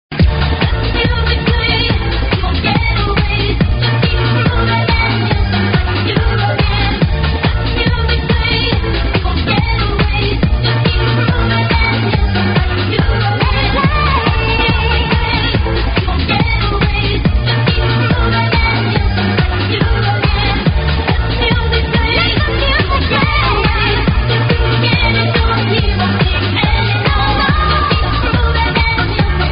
kick; multitrax

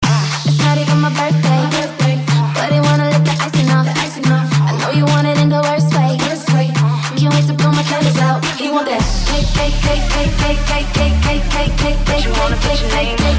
funk hiphop

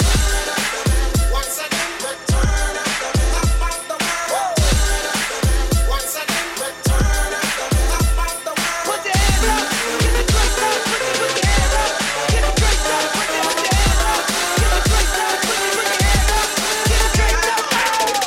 funk; multitrax

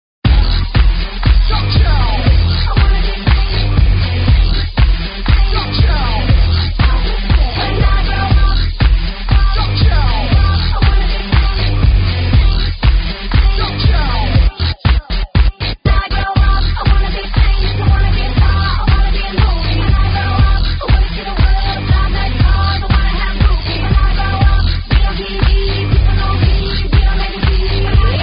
funk; multitrax

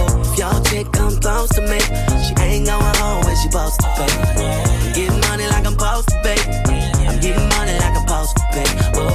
funk; multitrax

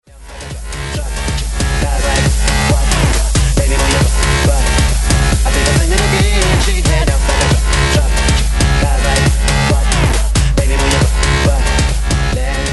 funk rnb hits remixed; multitrax

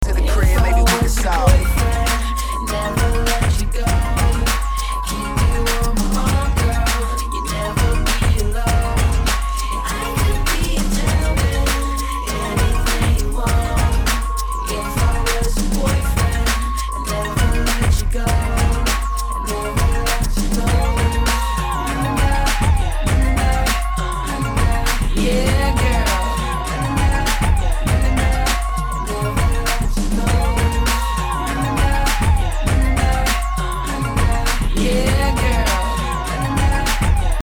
funk; multitrax

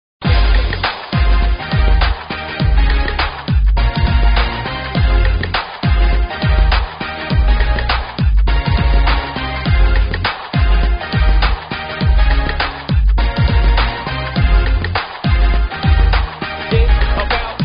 funk; multitrax

